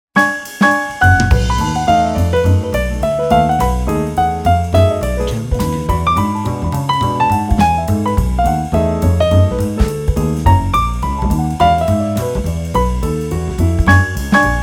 Jazz Piano Improvisation

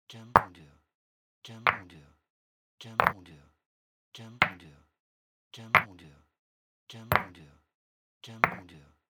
Stone Brick Knock

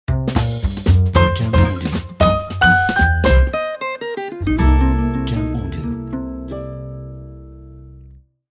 Jazz Ident

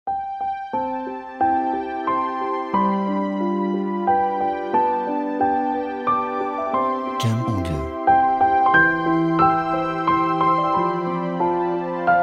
Happy Birthday Gently Piano

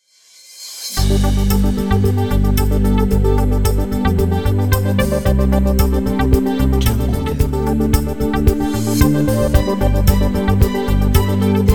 City Tech Upbeat

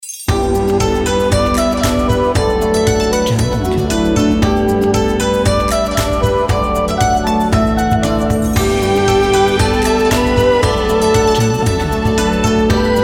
China Intro (Asian Logo)

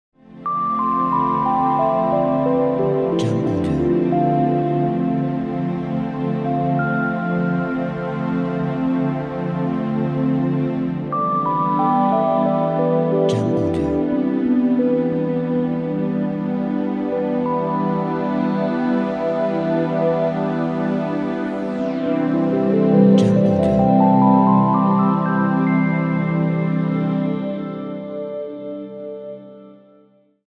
Ambience Intro

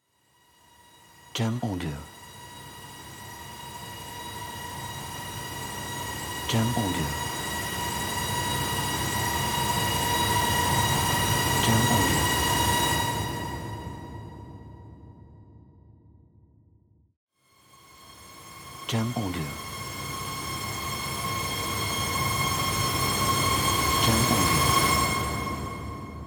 Rising Tension

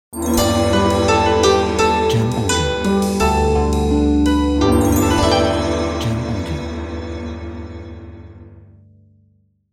China Logo

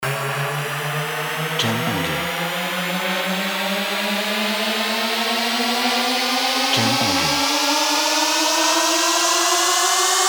Spaceship Start

